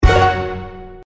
scatter 3; Horoscope

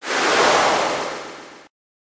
transition; Party Night

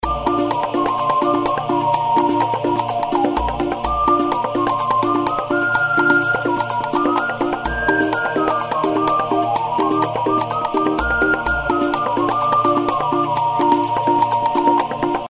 polynesian outro bgm

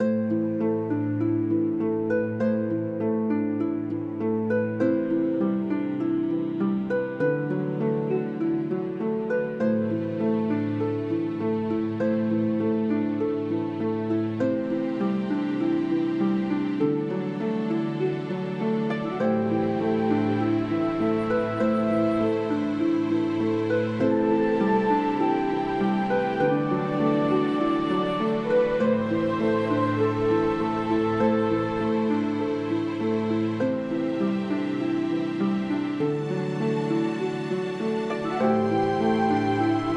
ambient; Magic Of The Ring; wazdan

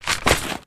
paper effect; Racetrack Riches; megaboard

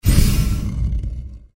intro outro sfx; Egyptian Empress

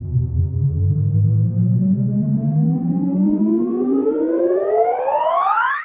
spin; Grand Fruits

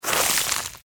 Skin Freezing; One Shot Fishing

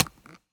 gambler mouseclick; Wazdan

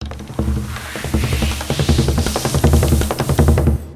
wild melody; games; Beauty Warrior

